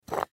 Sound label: Cologne Bottle Pick Up; Pick Ups; Objects; Blastwave; Foley